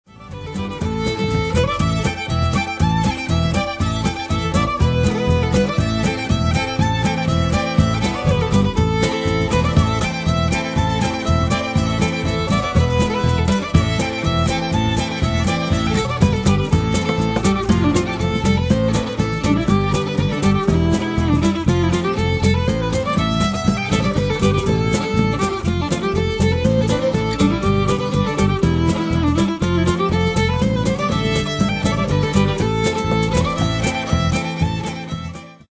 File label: shuffle; first street